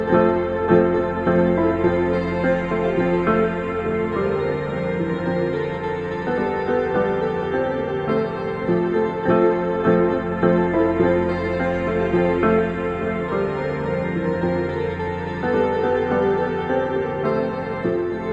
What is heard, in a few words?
ambient new age jingle bells loop